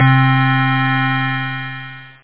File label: Sq bell